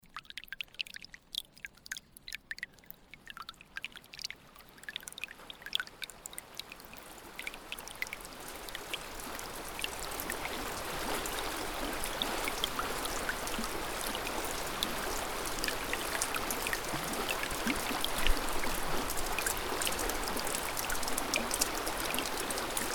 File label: Quiet Magic Soundscape